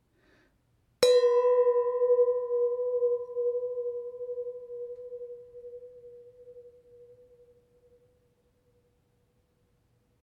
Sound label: Cheers, Wine Glass; Beat; Holthausen/Düsseldorf; Christoph Collenberg